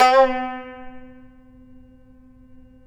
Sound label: TAR; Roland - LA Composer vol. 3